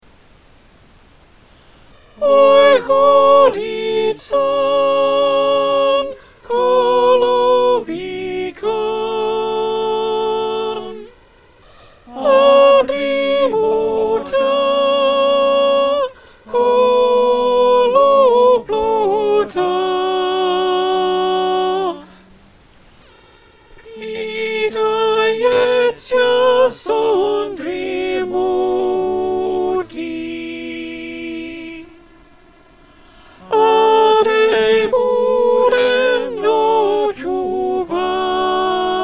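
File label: Soprano, choir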